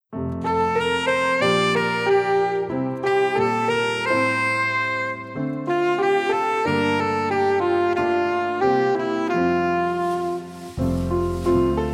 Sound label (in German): playback, Lieder